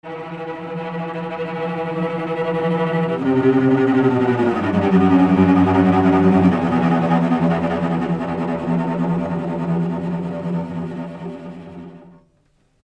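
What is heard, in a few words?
Cellos Tremolo, 150 Em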